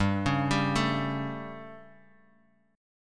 G Suspended 2nd Flat 5th G, Guitar Open D; chordshape; down

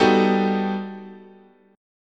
G Augmented Major 9th, chord

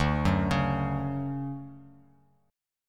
D-Major 7th D down; arpeggiated; JGuitar

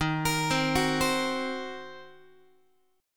Eb Minor 6th, down, Guitar; Ebm6 arpeggiated; x,11,13,10,10,11; 4A,4E,5A,5D,5G#,6C#; JGuitar

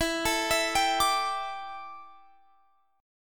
D Suspended 2nd Suspended 4th E

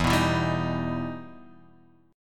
E Minor Major 7th E down

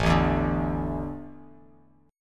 A Suspended 4th, Bass Standard; Asus4 strummed; JGuitar

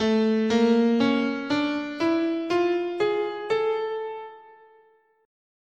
A Double Harmonic Major

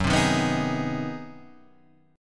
Ab 7th Sharp 9th, Gb

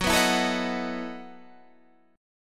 E Major 7th Suspended 2nd Suspended 4th Gb

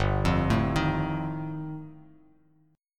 G 7th Suspended 2nd Sharp 5th G, Bass Standard; arpeggiated; JGuitar

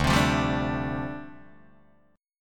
D Minor 11th D down Guitar Open D

